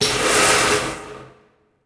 steam blast; Star Wars Obi Wan; XBOX

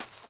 footsteps sand

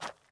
footsteps dirt

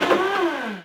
engine STOP; guido; Cars; XBOX

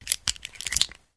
Revolver Insert Shells; Weapons

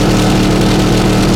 LOW MID, Engine; Cars; XBOX

